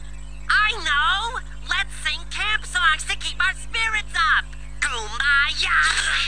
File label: camp songs